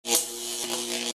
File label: tesla Sound